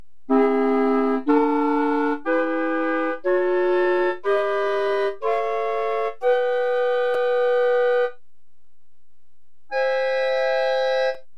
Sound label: whole tones